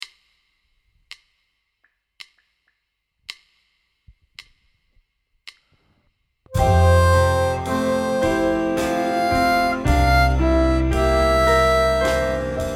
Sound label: F 55 harp